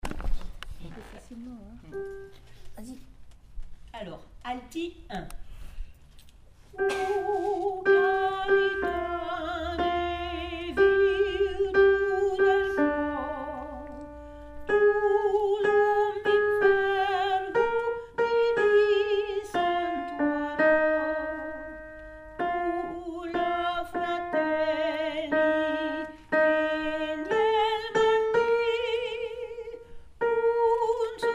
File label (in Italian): la carite alto; La Carita - G. Rossini